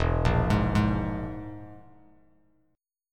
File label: E Major Flat 5th E, Bass Standard; arpeggiated; JGuitar